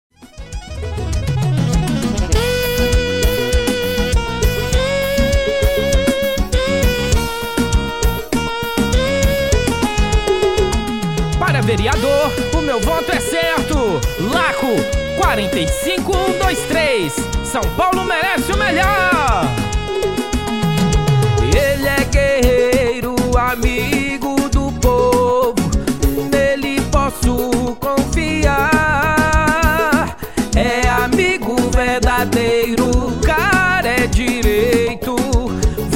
jingle; vereador prefeito eleicao campanha politica 2024

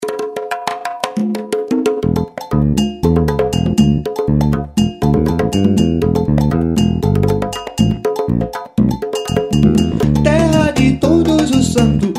toca berimbau, Instrumental

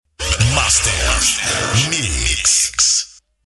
vinheta master mix

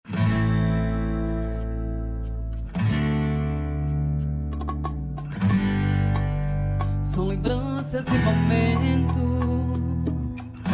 grito

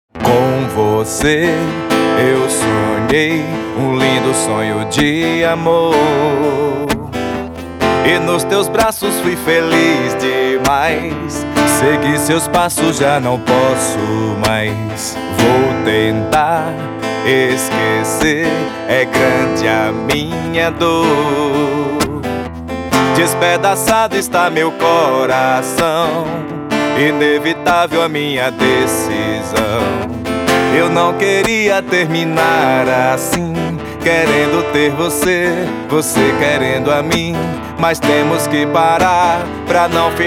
voz e violão

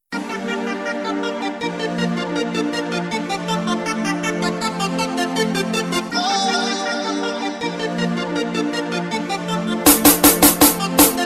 eletro funk som automotivo

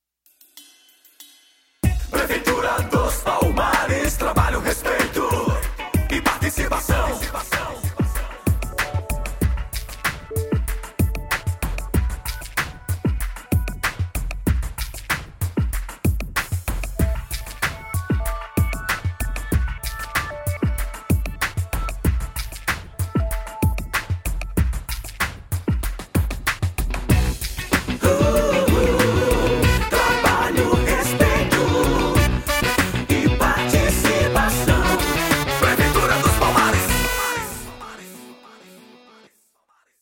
vinheta cantada; prefeitura dos palmares

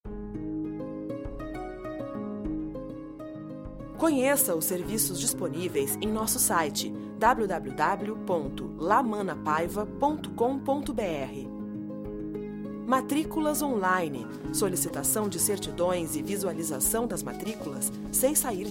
esperas telefonicas; registro de imoveis poa

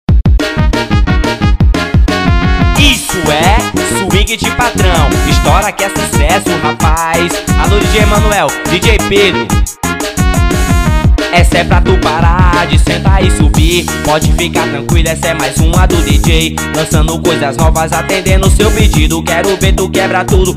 Swing

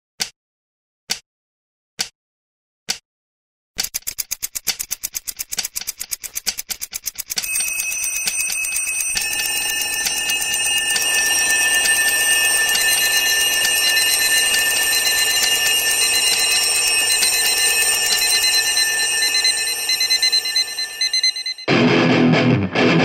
Despertar ( Instrumental )

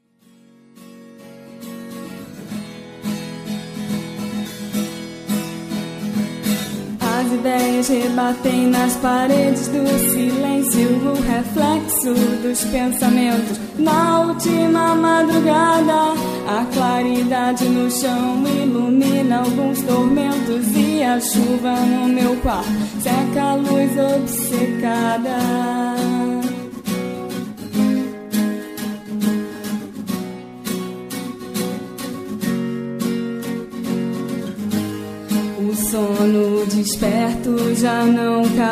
voz violao

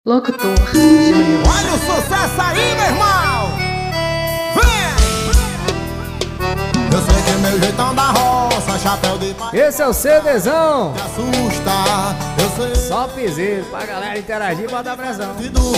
piseiro

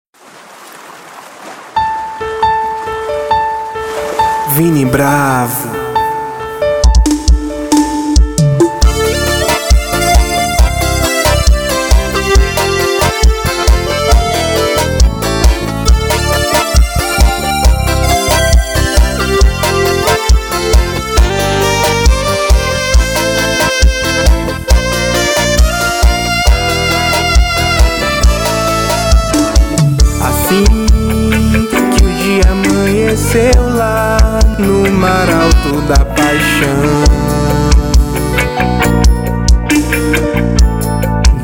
Piseiro Romântico

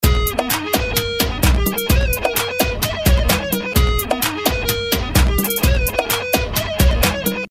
funk

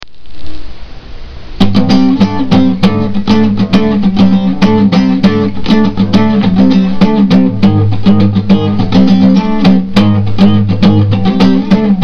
violao

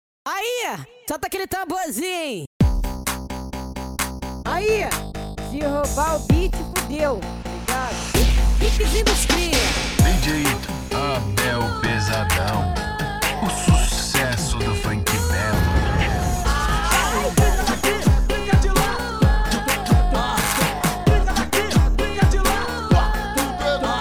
beats ritiminho melody; F5 Produções

